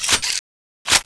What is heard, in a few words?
on, weapons